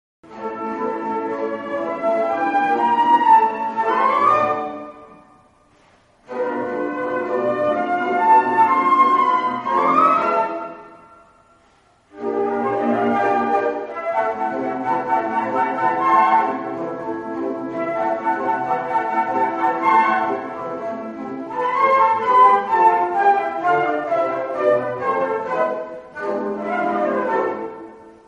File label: concert, flute; autumn concert 2019